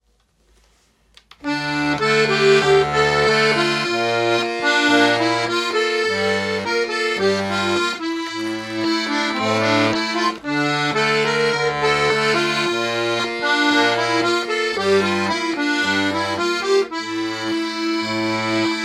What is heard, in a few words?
British Swing, diatonisch